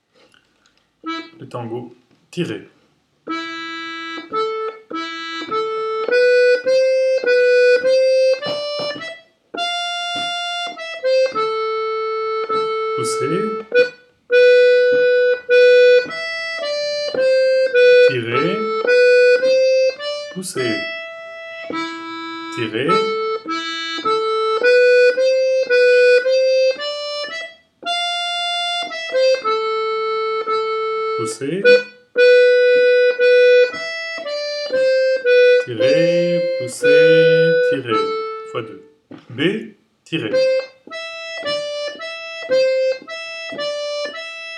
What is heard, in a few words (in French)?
Tango MD, accordeon